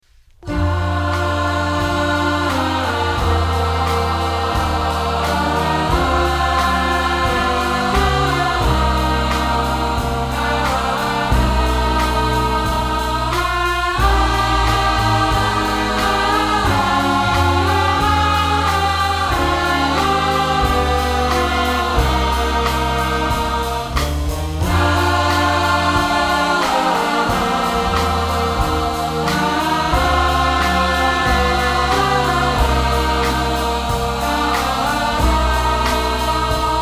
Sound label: Christmas Song